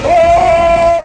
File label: roar